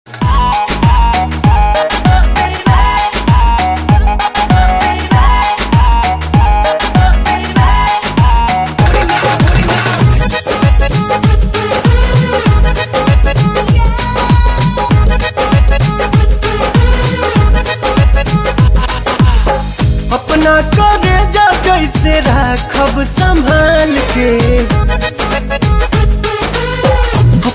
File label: Bhojpuri; Gadar